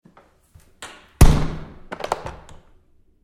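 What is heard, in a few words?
Door Bathroom Stall Close close-up Med with Lock; Bathroom Sound Effects; Big Room Sound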